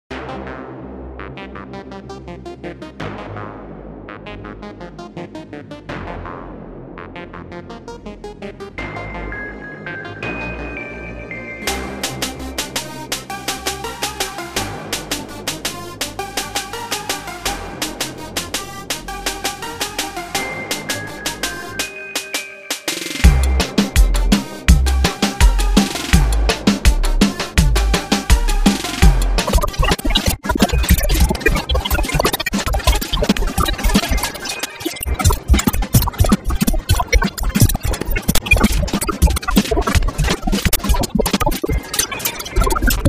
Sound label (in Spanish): Reggaeton Perreo Pista